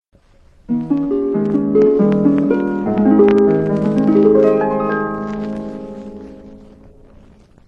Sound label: original harp; 1960 Original Harps